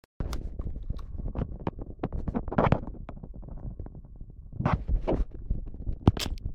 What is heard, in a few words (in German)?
aufzug; hoelderlin